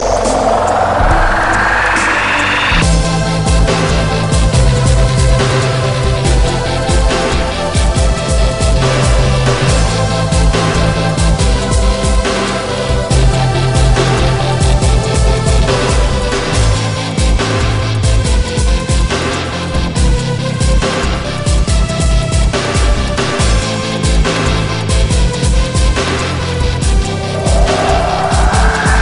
Dubstep

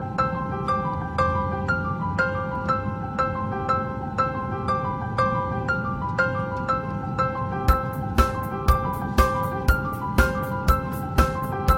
Piano Swing

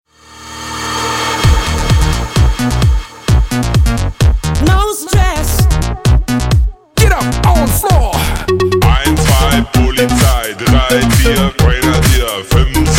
Beat

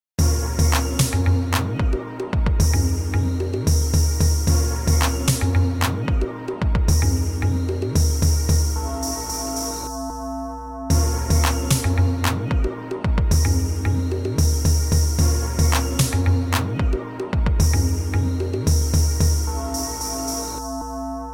Nice Tabala Tone

